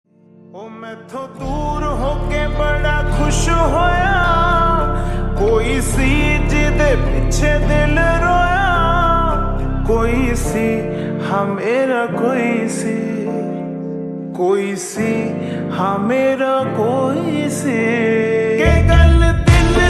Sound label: Slowed Reverb Ringtone